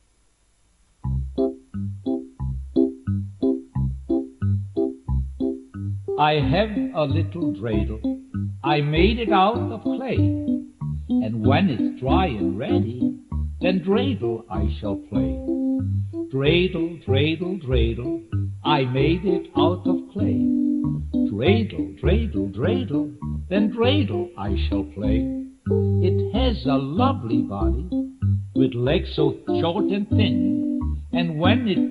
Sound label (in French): dreydel anglais, chants hanouka